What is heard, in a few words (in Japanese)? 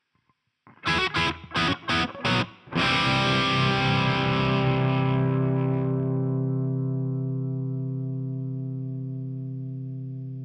ハム歪